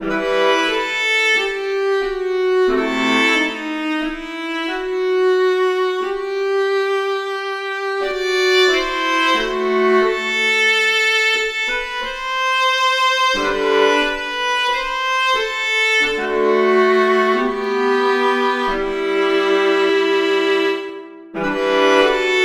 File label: Prelude for Solo Viola